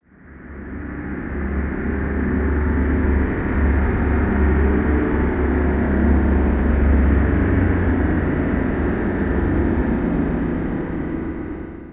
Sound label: DEEP METALLIC ATMOSPHERE; Sonic Foundry (Sony Creative Software); Vir Unis Infusion